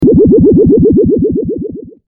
Spaceship

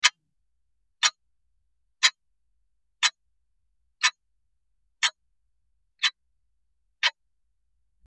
Clock ticking

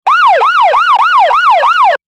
Police sirens

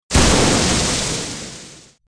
lightning strike; Sound FX

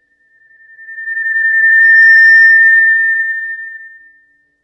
Microphone feedback

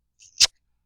Kiss

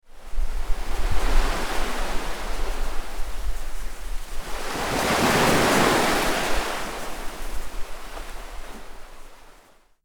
Beach

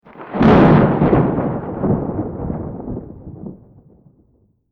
thunderstorm

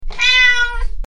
cat meow

